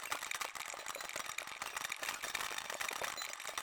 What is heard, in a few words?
Camera Broken-Rattle